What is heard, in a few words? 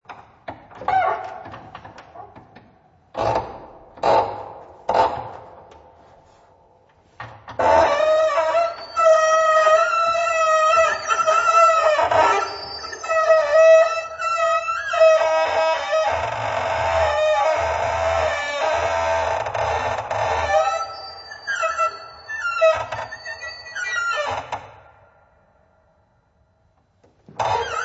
Piano